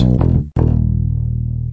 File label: three note bass; MELODIC SAMPLES